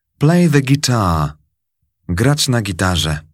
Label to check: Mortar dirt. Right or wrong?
Wrong